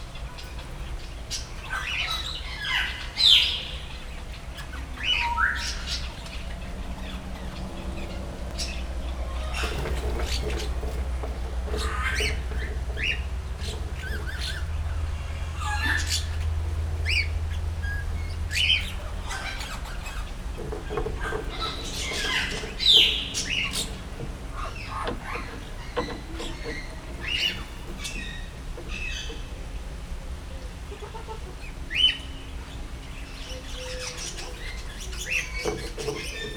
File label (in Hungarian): csőrét dörzsöléssel tisztogatja a végén egyet tojik, trombitás szarvcsőrű; Győri Zoo 2014